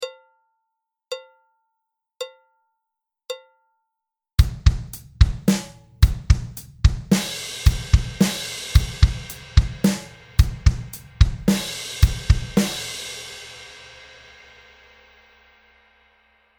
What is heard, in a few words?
drum beat